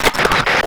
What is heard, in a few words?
radio